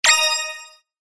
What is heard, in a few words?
break and chain